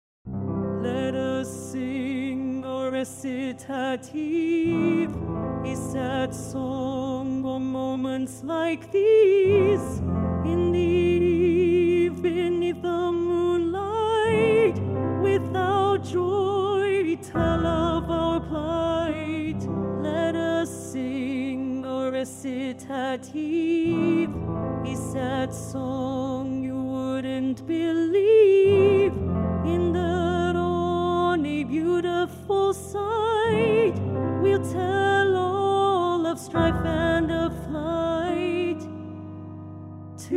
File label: Recitative; Felicia